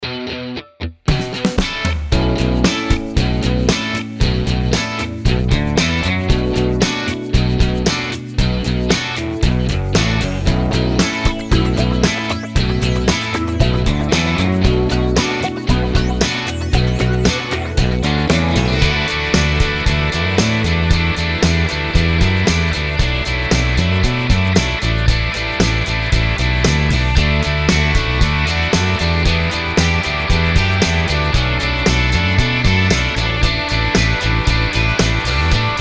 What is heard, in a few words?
P fingers mix